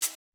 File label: Hihat